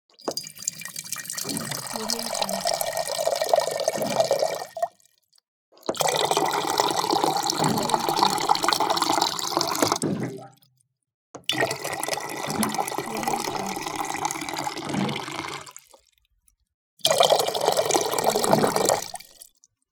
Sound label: water dispenser; pure-noise